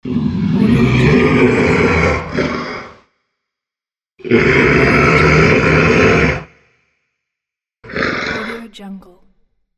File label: monster roar